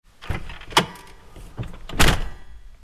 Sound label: door; Fantasy Football Locker Stalker